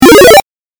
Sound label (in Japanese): 8bit 詠唱